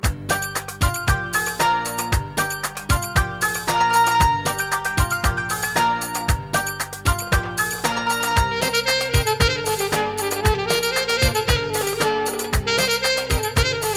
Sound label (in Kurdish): Saksfoun